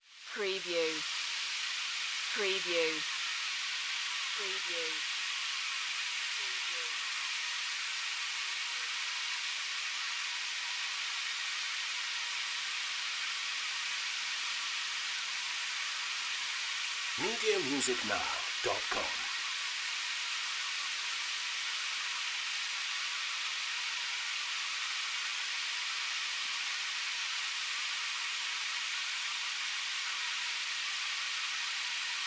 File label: Ambience Jungle Day; Nature Sounds / Ambiences